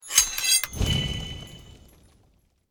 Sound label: Magical Enhance or Upgrade